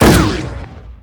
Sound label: Gun Sniper Rifle Shot; SFX Kit; Weapons